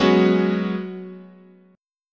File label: Gb Suspended 2nd Suspended 4th, chord